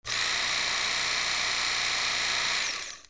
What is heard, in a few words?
KITCHEN